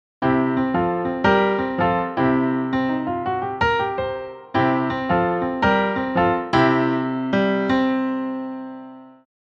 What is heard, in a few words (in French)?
impro piano; loto sonore